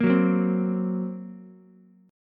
Gb Major Flat 5th, down, Ukulele; strummed; JGuitar

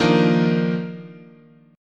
D sharp Major 7th Suspended 2nd, chord

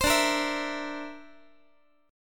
D 7th Suspended 2nd Sharp 5th D, Guitar; D7sus2#5 strummed

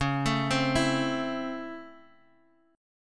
Db Minor Major 7th Sharp 5th, Bass Standard; 21,24,22,21; arpeggiated

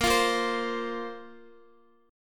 A sharp Suspended 2nd down Guitar; strummed; A#sus2; JGuitar

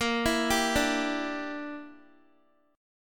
Bb 6th Flat 5th Bb, Guitar; Bb6b5 arpeggiated; x,13,14,12,14,x

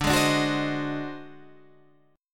D 7th Flat 9th Flat 5th

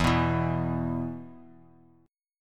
E 5th; strummed; JGuitar

